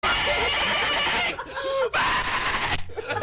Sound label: rage; gta